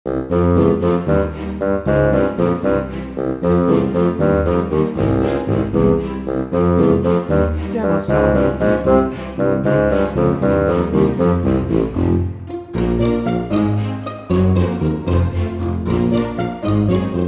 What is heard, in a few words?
Bouncy Little Tale